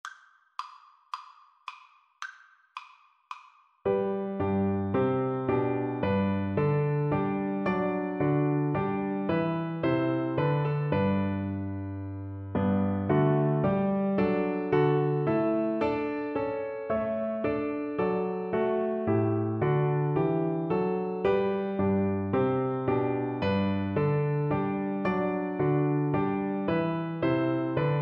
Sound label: bouree, cello